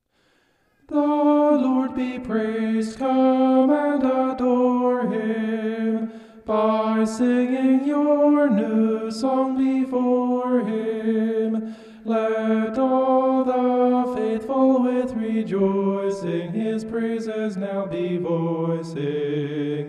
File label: vocal homoph; psalm 149